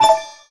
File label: ui academy button yes